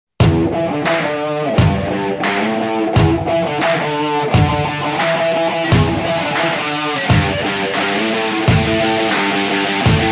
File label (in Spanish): Musica de Fondo Energetica Para Videos y Deporte